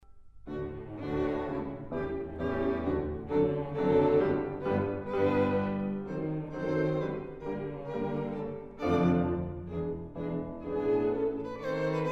piano qnt; kiel

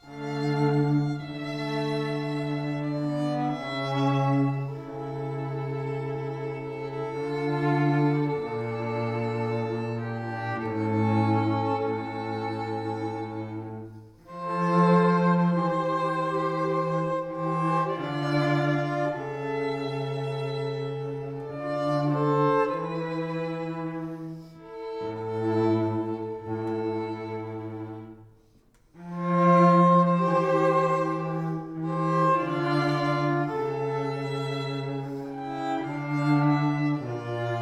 trio; pezel

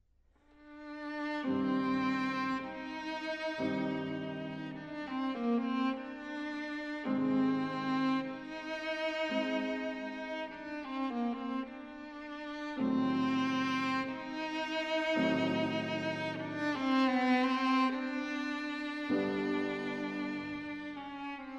viola son; bantock

